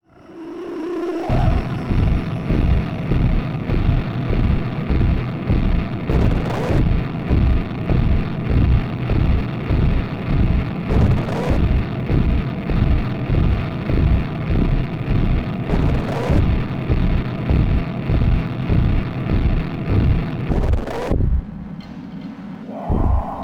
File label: Fight Drone; dying light gamerip 2015